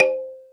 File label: Jungel Percussion, E-MU Pro-Cussion